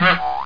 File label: ERASE; Etch A Sketch Quick Tour